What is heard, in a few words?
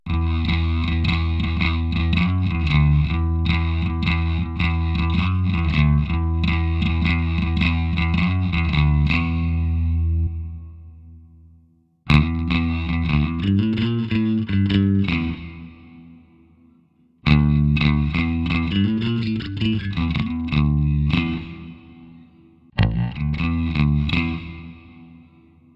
e bass